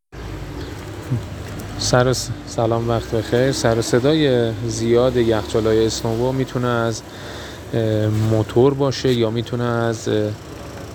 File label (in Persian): سر و صدای یخچال اسنوا خرابی یخچال اسنوا